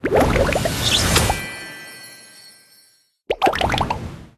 win screen hearts; Candy Crush Friends

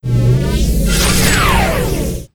Skill Sonic blade; FlyForInsanity

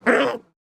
Big Raccoon Dmg; FlyForInsanity

